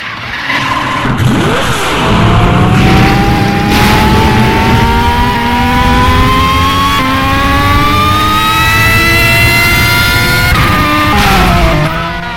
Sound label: pagani zonda car engine